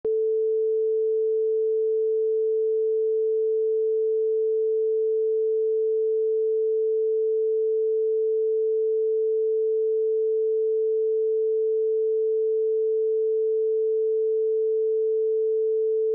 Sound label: A440 standard A4